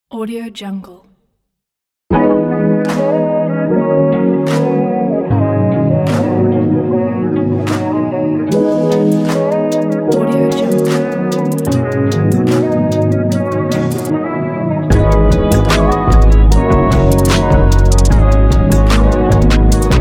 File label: modern chill rnb